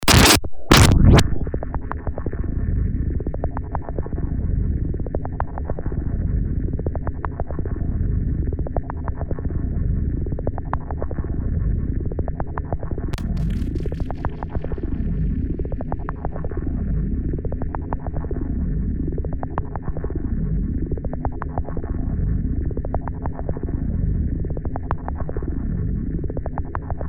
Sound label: Hard Scratch Long Drone